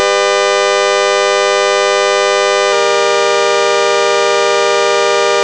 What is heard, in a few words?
saw, vocoder